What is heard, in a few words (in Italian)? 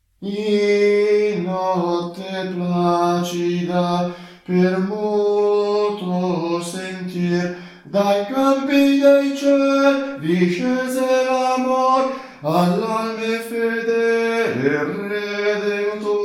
tenori; In notte placida